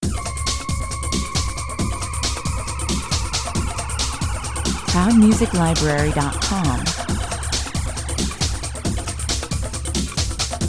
depth charge 115 bpm

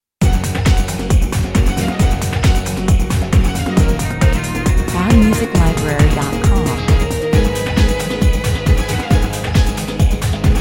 gypsy drive 135 bpm

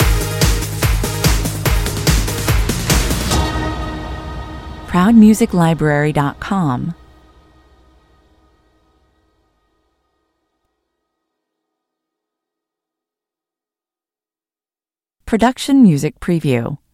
fresh energy stinger

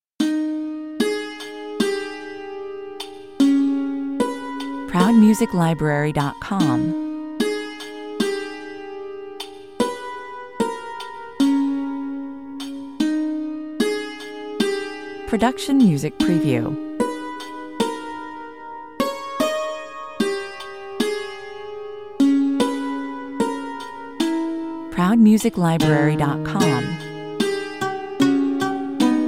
snake dance (no drums) 75 bpm

